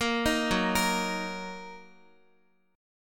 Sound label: Gb 6th, Guitar; Gb6 arpeggiated